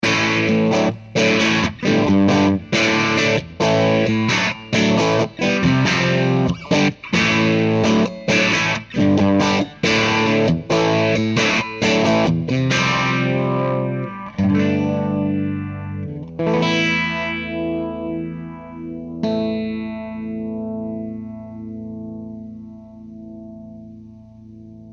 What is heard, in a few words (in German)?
Zerre